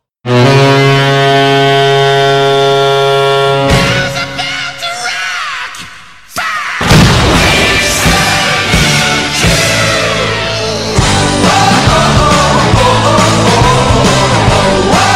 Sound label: Horn